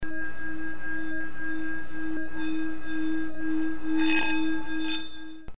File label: bare hum